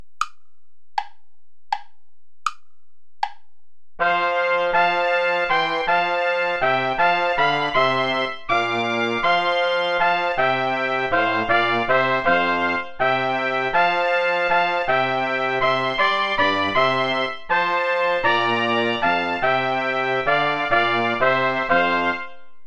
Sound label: play along without trombone